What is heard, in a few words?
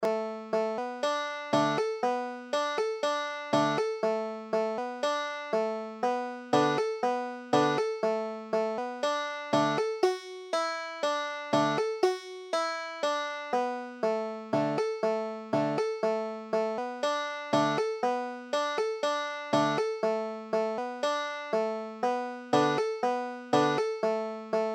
banjo